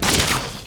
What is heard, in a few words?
Bow; nox